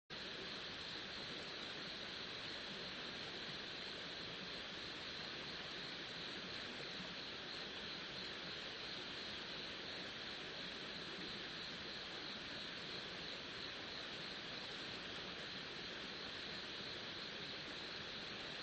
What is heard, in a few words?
riverside; Kyoto U; Ashiu